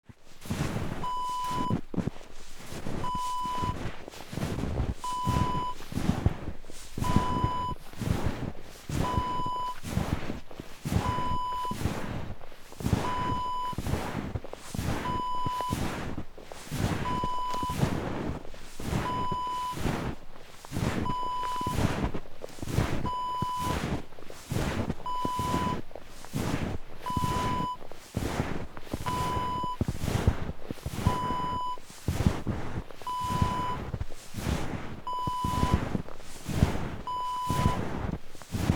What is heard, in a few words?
footsteps in snow